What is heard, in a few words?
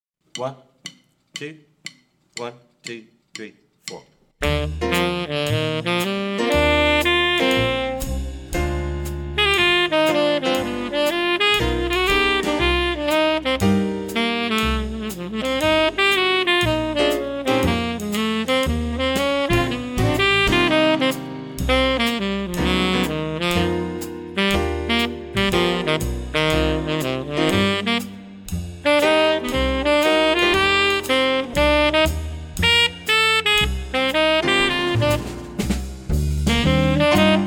swing etude tenor sax